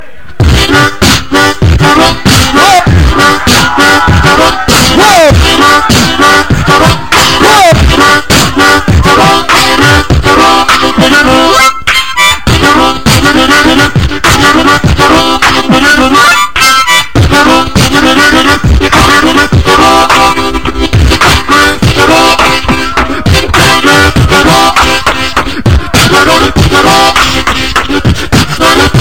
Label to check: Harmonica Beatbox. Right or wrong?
Right